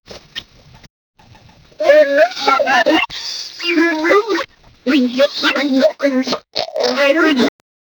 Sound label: samples of shenai, wind instrument